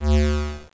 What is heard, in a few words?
whoosh